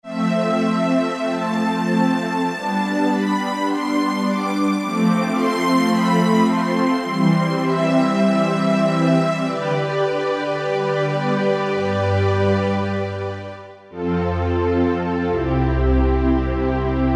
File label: Syn Strings 1, Yamaha PSR-3000; Factory Voices